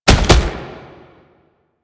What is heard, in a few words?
Gallop F, Steps; Enemies; Proto Infested Brachiolyst; Warframe